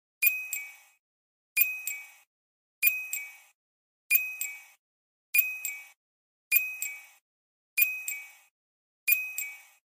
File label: speed chime; ae86